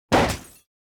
COLLISION CRASH LITE; SIMUZENSOUND; Collision Lite Bass

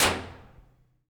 Small Metal Room, Reverb; iZotope Hybrid Reverb

